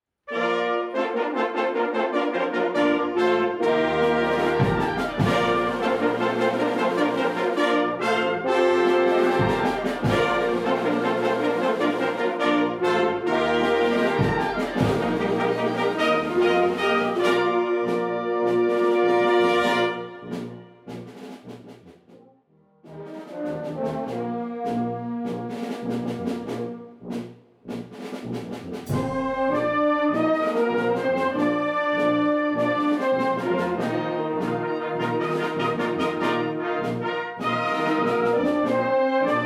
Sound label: Fanfare for a fresh start